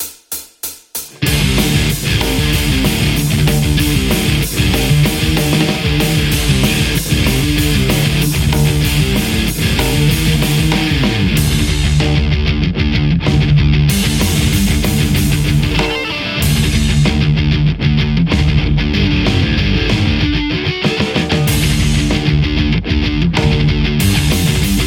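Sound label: metal Stratocaster; bteaudio juicy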